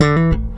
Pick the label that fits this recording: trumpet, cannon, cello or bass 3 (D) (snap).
bass 3 (D) (snap)